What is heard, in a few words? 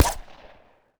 claw shoot